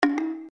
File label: ringon sms